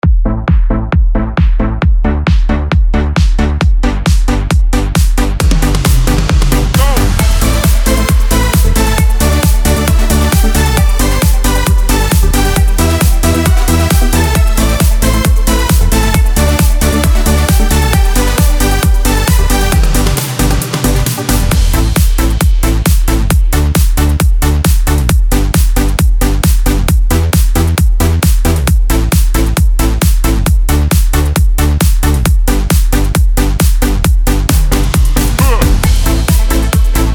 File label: Style Yamaha; yamaha psr s950